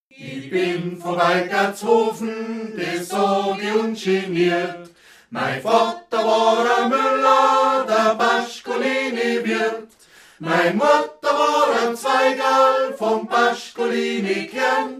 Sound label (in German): Balladen Moritaten; Mathias Kneissl